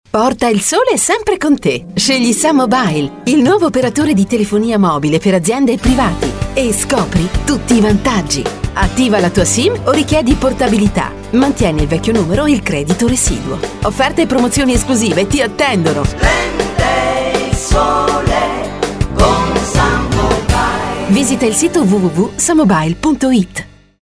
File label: Jingle spot; SunMobile